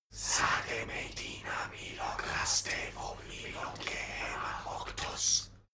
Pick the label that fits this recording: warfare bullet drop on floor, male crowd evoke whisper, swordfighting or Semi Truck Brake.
male crowd evoke whisper